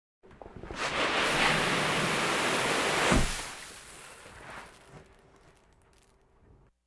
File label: Animal effects snorts grunts farm ambience; Valentino Production Sound Effects Library CD50